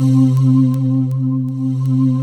C4, Synth Leads; 90 s Sample CDs; NiceBeats (NiceBytes); Studioline 09

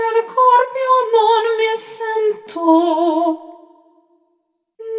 Imperativo, Vocal Samples, Loops; Sonic Foundry (Sony Creative Software) - Pocket Diva World Class Vocal Samples; Op-Erratic Loops

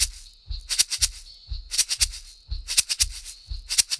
SHAK, Roots Disco; USB Soundscan vol.32; AKAI

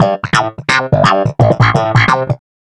Wah Snap in A, Snap Bass; Basses

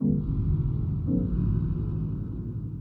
PAD, Hip Hop and R&B; Drumloops; Best Service; ProSamples vol.01; AKAI

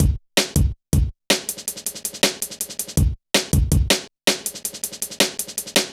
Kicker, Jungle, Drum Loops 161.5

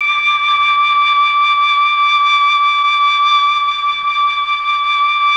MELLOTROF

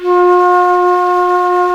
F-3 FL, FLUTE; AKAI S-Series CD-ROM Sound Library VOL-7

